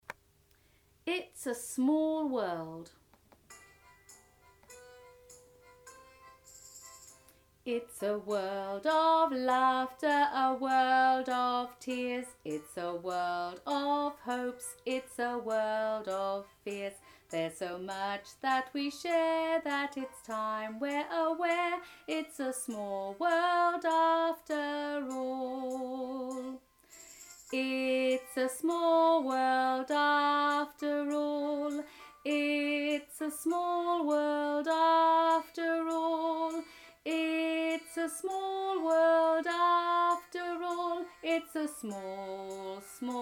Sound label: with Vocals